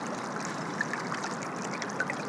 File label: Icy Stream, On The Glacier